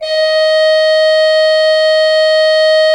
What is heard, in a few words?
HGRP D4, Cathedral Organ, HOLZGEDACKT; Propeller Island